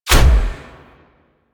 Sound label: Dash Impact; Powers; Movement; Warframe; Focus; Operators; Wraith